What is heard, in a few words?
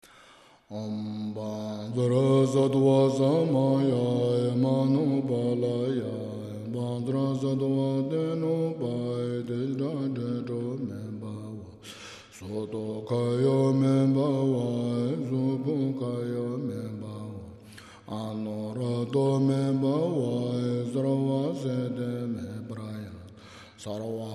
Mantra of Purification Vajrasattva Mantra; Khenchen Rinpoche